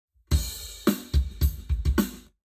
Drums, 90deg; eBreve; Lab Wet

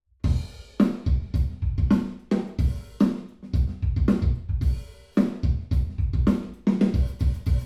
drums, binaural; em32 Aalto

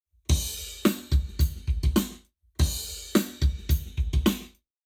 Lab Wet Drums 0to360deg; eBreve

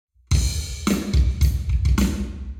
Hall Drums 90deg; eMagLS

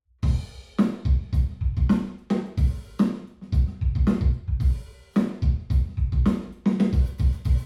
drums, binaural; em32 Aalto

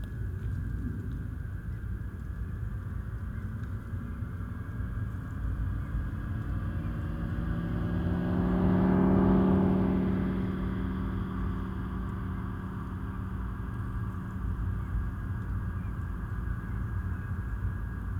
Omnidirectional AVAS, electric vehicle

